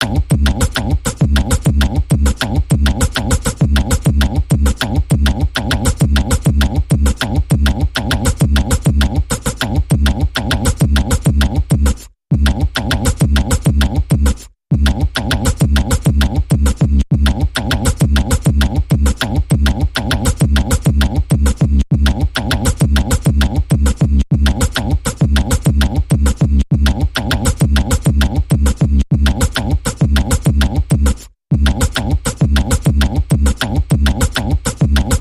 Rhythmic Percussion Variation; Long Version

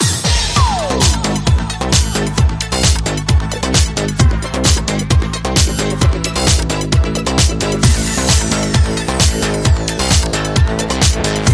Electro Ramp

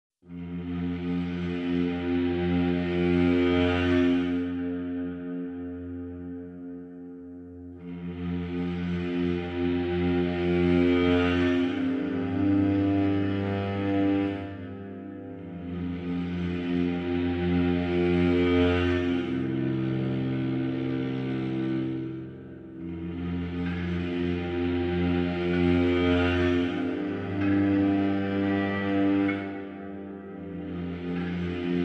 Ambient

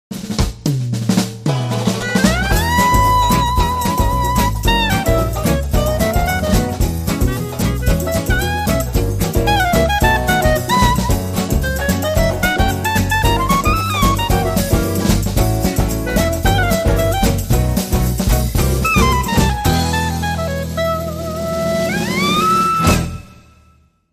Clarinet Solo

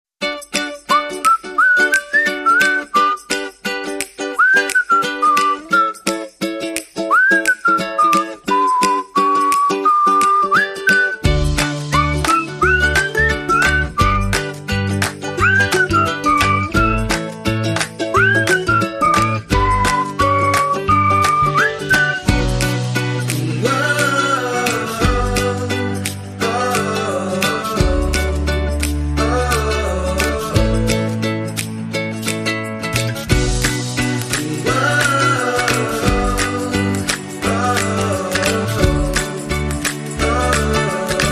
Clap Along With Us

